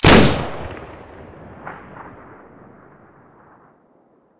bm16 short hole stereo, weapons

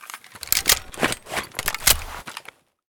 reload

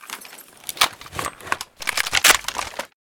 reload; S.T.A.L.K.E.R.; IWP; mdr

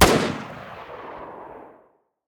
sks muzzle mono distant, weapons

